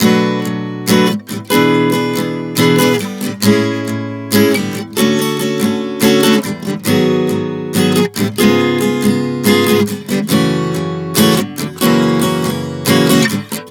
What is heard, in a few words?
Progression 070 Bm-E7-Amaj7-Dmaj7-G#m7-C#7-F#m7, Acoustic Guitar, Chord Progressions 070 bpm; Sonic Foundry; Songwriter Acoustic Guitar Companion